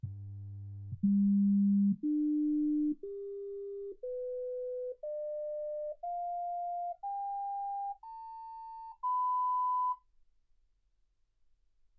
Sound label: sinus tones 48kHz